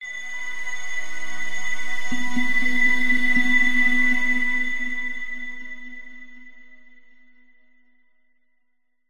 multimedia logo musical warm swell bright bell like; zapsplat